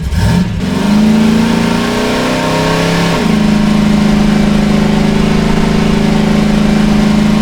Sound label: v8 first gear rev loop; vehicles; Half Life Alyx